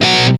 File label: METAL POWER; East West; Global Sounds